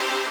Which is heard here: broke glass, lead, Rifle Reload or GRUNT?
lead